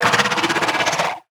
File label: death; Barotrauma; Hammerhead